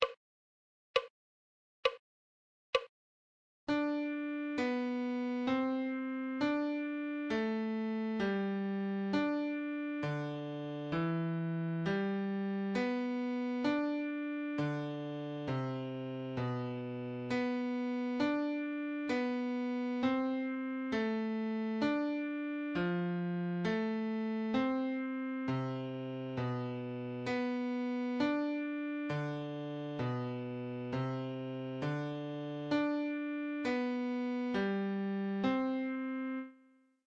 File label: musical reading in bass clef Adagio